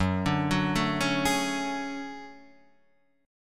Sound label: D Major Sharp 11th F sharp, chord